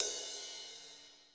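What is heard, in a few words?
processed cymbal ride, drums; Crypton - DrumBase5000; PROC.DRUMS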